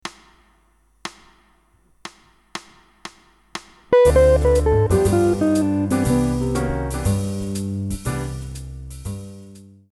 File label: guitar scale exercise example